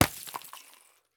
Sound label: Ice Blocks, impact, cracked, tiny pieces, scatter, slide, concrete, chunky, ambiance; Vaeyan I